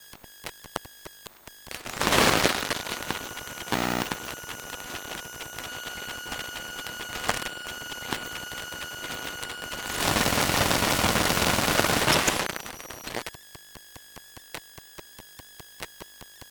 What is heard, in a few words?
Mobile Phone Locked Screen On, Electromagnetic Fields; ktwAudio; Unheard Sounds